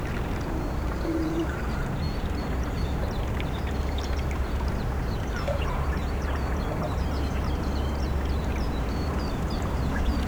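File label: waves crash on rocks seagulls, ocean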